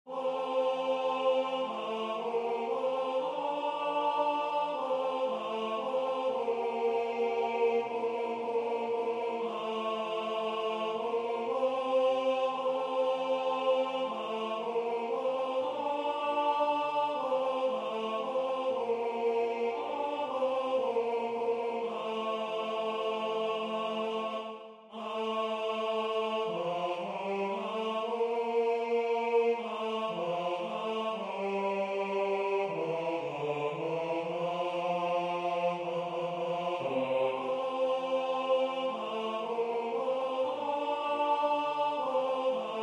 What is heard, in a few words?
Hoki Hoki tenor